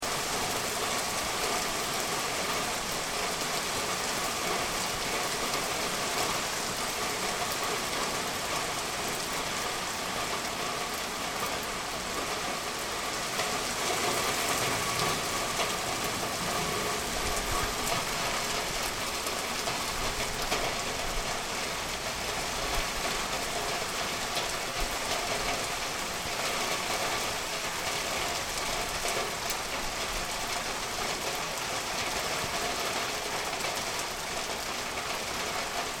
Monsoon Rain Downtown, Monsoon Rain Downtown With Thunder; Weather; Rain (Sound Effects:Weather); CraftedSignals